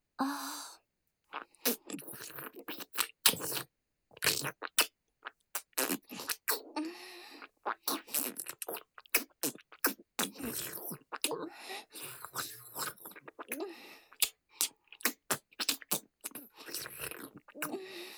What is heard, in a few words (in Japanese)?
フェラ(口交)